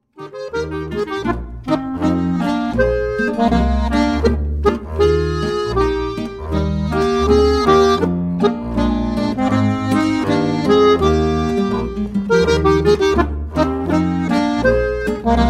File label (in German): Boarischer; Staketen